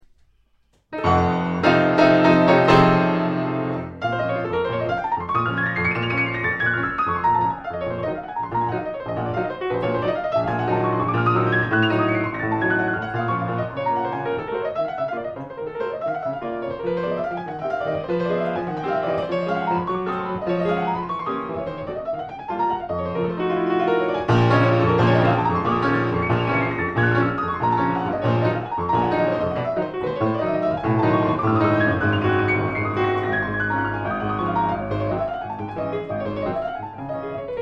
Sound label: chopin prelude Bb minor